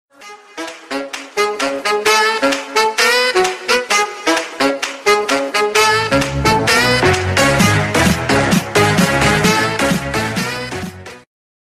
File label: twitch alert follower sub donation